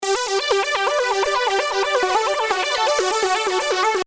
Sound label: arpeggiator